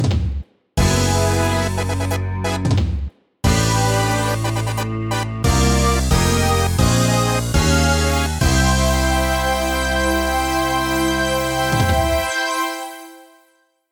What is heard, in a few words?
Victory Fanfare; Nintendo - Kirby Mass Attack; Video Game OSTs; Kirby Quest - Chapter Clear